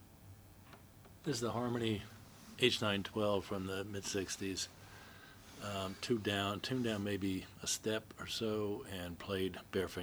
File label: harmony stella h912 sunburst harm stella